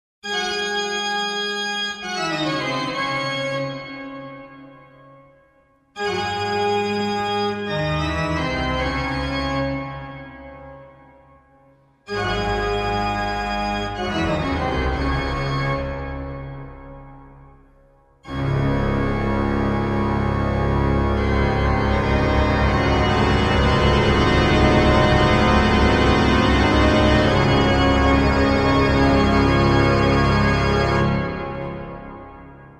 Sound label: Toccata & Fugue in D Minor, Toccata; BWV 565